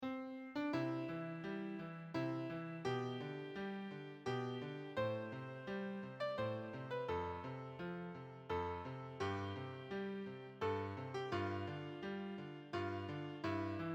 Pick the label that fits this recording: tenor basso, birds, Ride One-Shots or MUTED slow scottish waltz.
MUTED slow scottish waltz